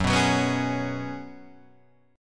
F sharp Suspended 2nd Suspended 4th, Guitar Half Step Down